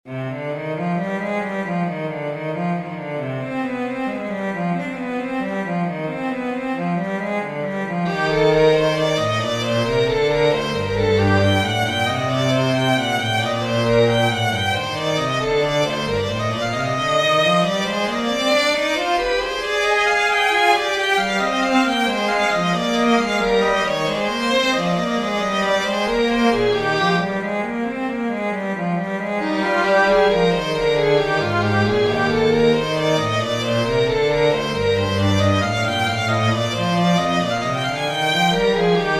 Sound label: fughetta minor for violin cello; bach johann sebastian